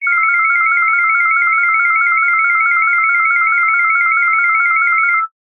science fiction computer alert alarm

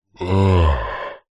fantasy giant male sigh

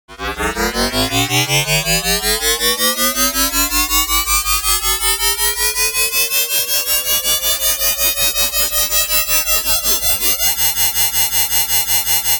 science fiction device power up; sound effects one

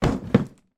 cardboard box lid put on; foley; zapsplat

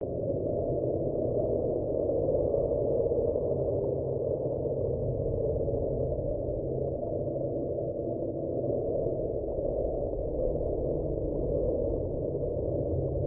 abstract wind background loop; the sound pack tree

